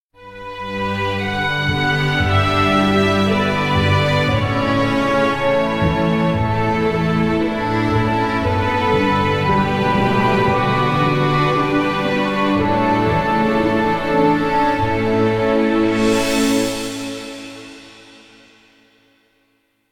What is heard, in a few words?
Orchestra; Finding Paradise; Kan (Reives) Gao